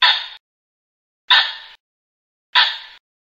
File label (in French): Faisan cri alerte